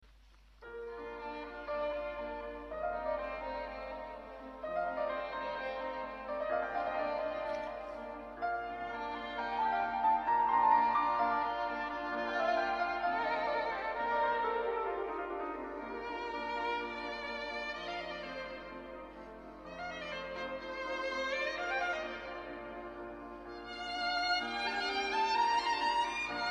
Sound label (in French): mozart sonate si bemol